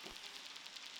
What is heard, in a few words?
Background Noise Clips